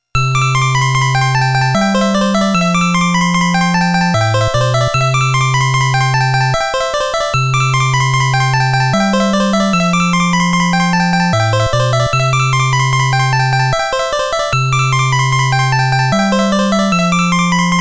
BGM Ending; Super Mario Maker

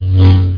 Lightsaber Swing; CastleMiner Z; Xbox 360

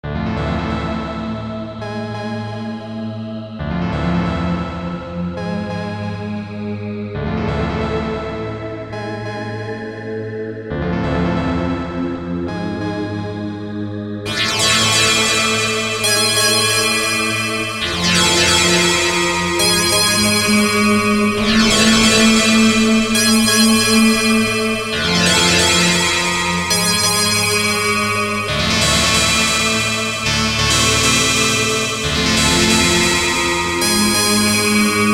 Unfolded No drums; Morphite

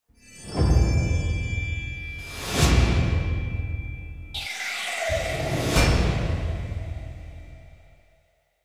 LOGO SFX; edge of eternity

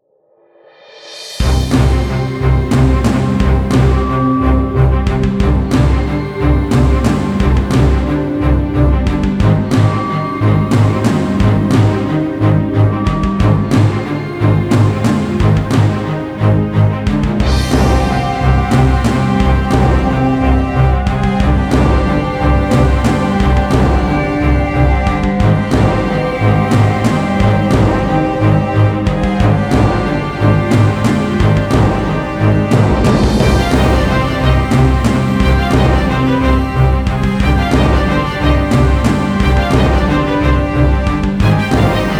Storm; Sea Dogs To Each His Own

